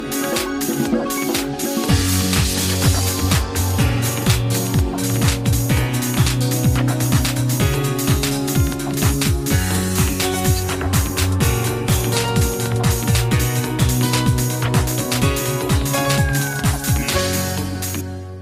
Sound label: Infinix Ringtone; Infinix Smart 5 Pro